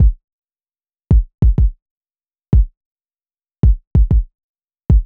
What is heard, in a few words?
Hip Hop Kick, Drums; Pro Tools Essential Loops; Digidesign